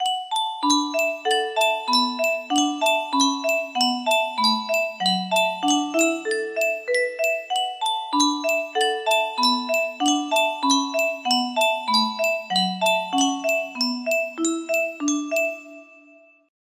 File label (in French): Yunsheng boite a musique